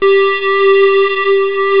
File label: square solo, instruments; sonix; Rise Of The Dragon; chipmusic